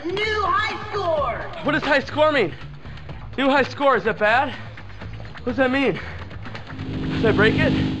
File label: New high score